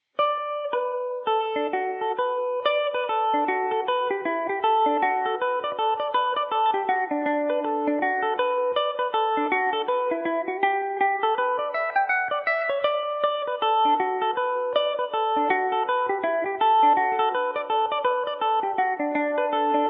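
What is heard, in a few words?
D major revelation, Irish mandolin; maid of holywell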